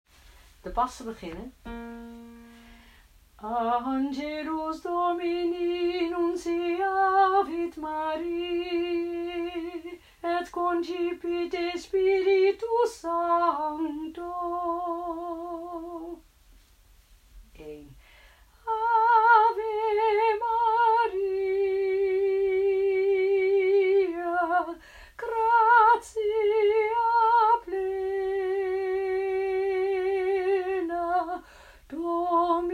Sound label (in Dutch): Tenor